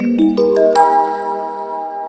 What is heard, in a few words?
climb, notifications; Sony; Xperia Z3